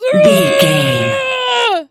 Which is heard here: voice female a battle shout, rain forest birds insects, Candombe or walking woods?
voice female a battle shout